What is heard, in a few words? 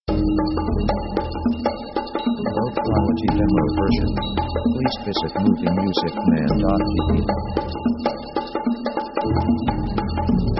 orc progressing adventure; orchestra themes